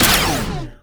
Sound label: Ufoblock Common Delete; Enemy Ufoblock Common Delete; gamerip